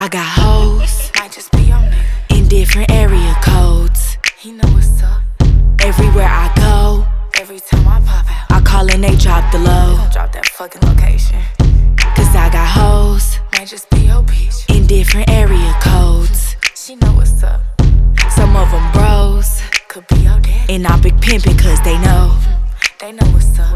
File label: ringtone